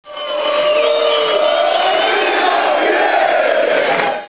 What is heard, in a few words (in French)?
Ho hisse